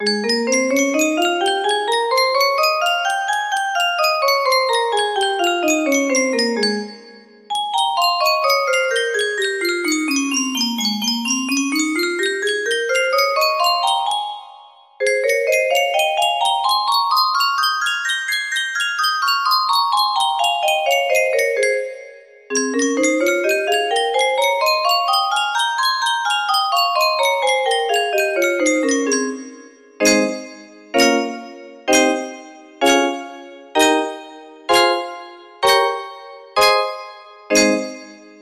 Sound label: A flat major, music box